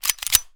pistol reload; hygrounds

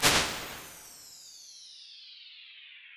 medium reverb

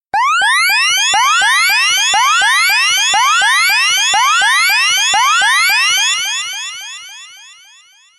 alert

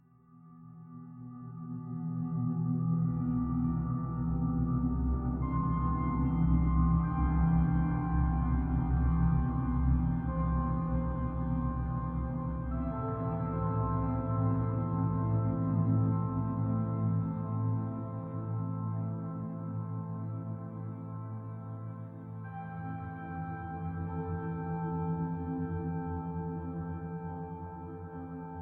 quiet, music; vignette; craft

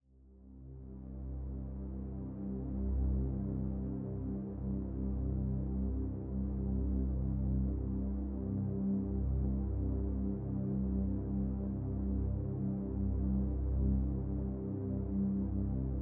pad H5; thetascape; delta

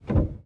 piano release; samples-piano

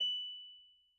incoming message

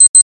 2 Bips

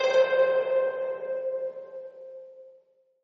harp note H5; thetascape

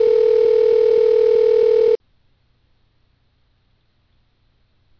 ringout, tones

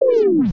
drop; Term-Rex

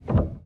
piano release; samples-piano

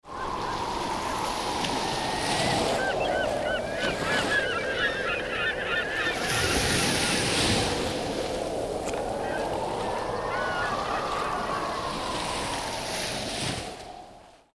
amb; PARADISE GUAJIRA

